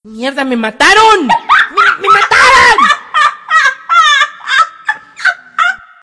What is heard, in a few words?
mhaha; cstrike; Skull sounds